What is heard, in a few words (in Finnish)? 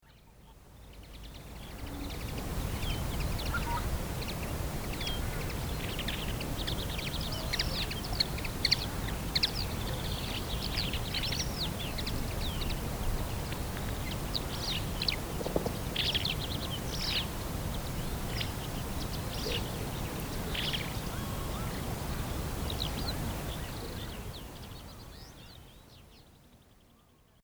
hemppo; linnut